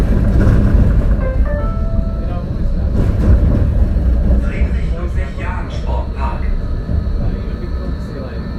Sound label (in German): Tramgeraeusch